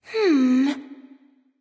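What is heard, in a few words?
thinking, nonverbal; smite; voicepack; khepri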